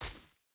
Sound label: footstep hide grass jump land; Rust